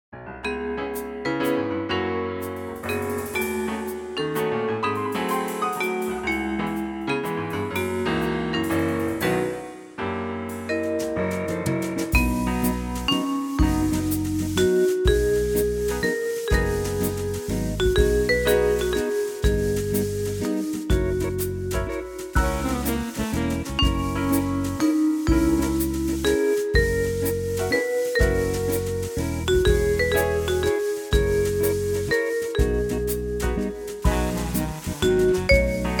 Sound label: jazz